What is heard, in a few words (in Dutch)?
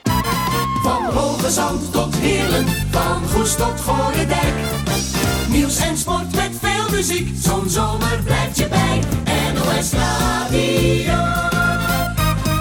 omroep jingles